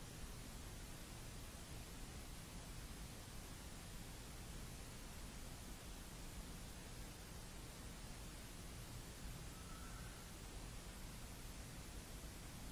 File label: Whistle